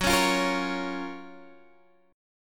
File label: Gb Major 7th, down, Guitar, Standard; GbM7 strummed; JGuitar